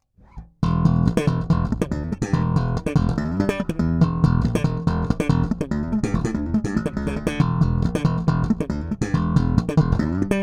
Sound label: Marleaux passive Slap